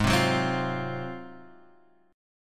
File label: Ab 13th Ab down, guitar, Ab13 chord; strummed; JGuitar